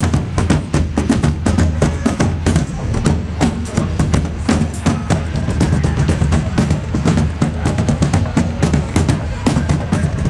distant street drummer playing makeshift percussion